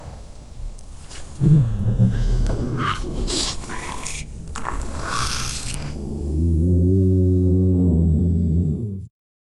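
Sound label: the slow shambling footsteps, strained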